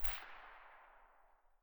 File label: silencer mono very far